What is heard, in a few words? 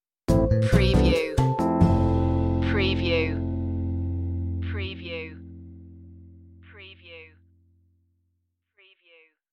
jazzy idents